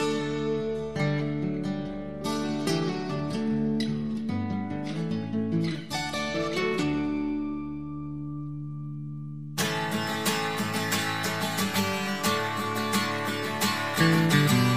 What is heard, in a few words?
Rock